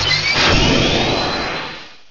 Searing Shot; Pokemon Circon BETA 2 v1.8; Pokemon Essentials

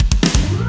Character Select Intro, Music; TMNT

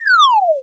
Fall; easyrpg; Ib

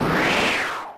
gust; Pokemon Essentials; Pokemon Iberia V1.03

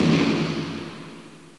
Explosion; Pokemon Titan V2.04; Pokemon Essentials

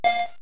ball drop; Pokemon Essentials; Pokemon Opalo

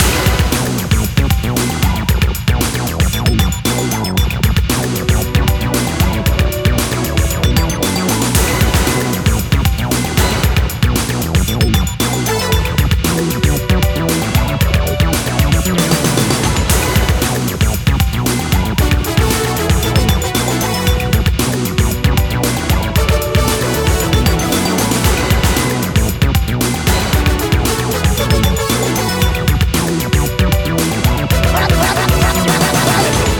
Menu Loop, Music; TMNT

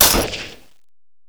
gun; easyrpg; WitchsHeart

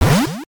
Battle recall; Pokemon Essentials; PKMN Estelar 1.3

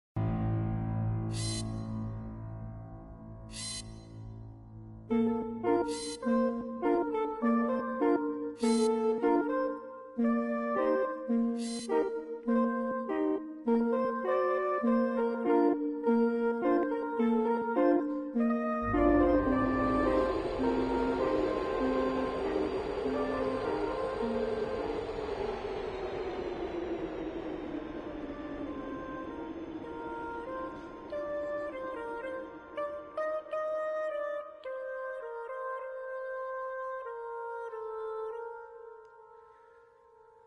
Turret harmonie; Flywheelgaming; Portal 2

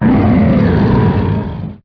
Cry

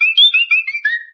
Cry

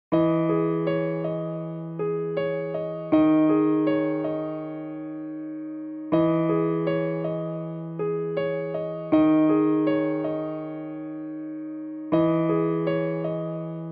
bgm piano; maoudamashii; easyrpg; WitchsHeart

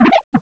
Cry

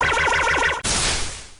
Work Up; Pokemon Essentials; Pokemon Opalo

